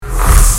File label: teleport